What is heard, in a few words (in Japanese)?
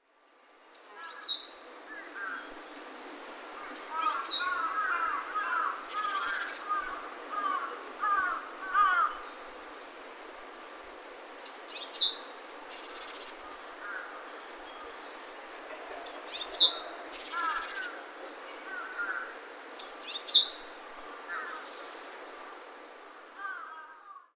shijyukara